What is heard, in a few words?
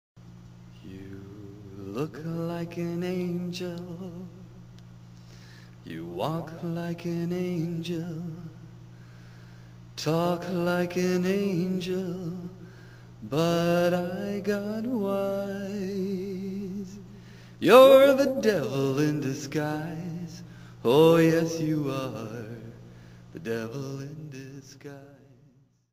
cover acappella